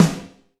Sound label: Snare, Alesis DM5 Drum Machines